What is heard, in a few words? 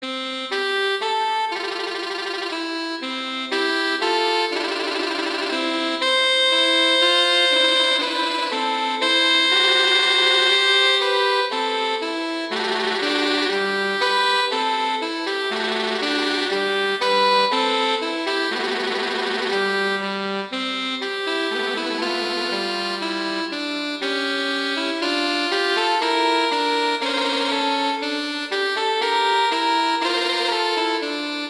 Larghetto; Telemann; Canonic; Sonata 2